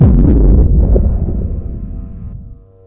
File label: thumper hit, machines; ambient; Source Engine; video game